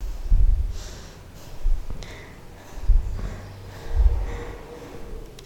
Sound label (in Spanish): Respiracion Suspenso